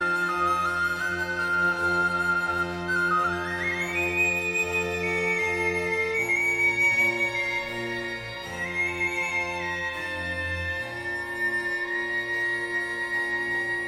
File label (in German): concerto c dur fuer sopraninoblockfloete streicher und basso continuo; largo